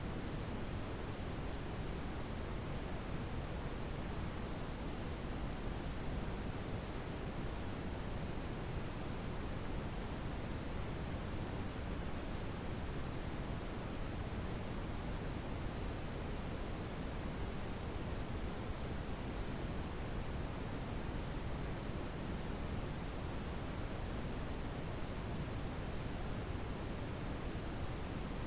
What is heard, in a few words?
plain brown noise